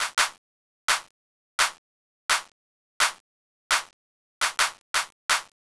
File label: pc clap